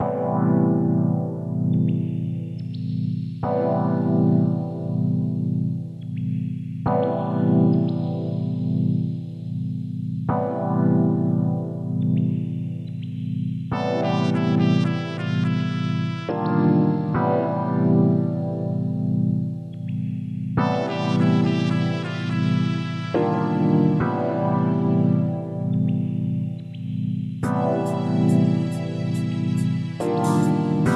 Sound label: smooth jazz cafe